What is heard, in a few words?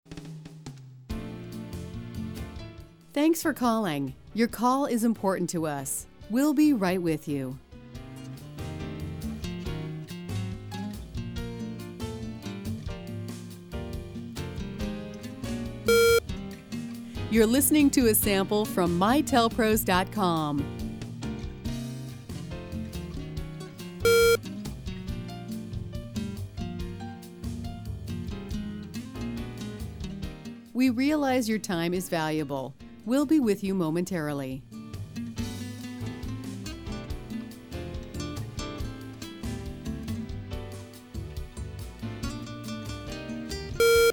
Light Jazz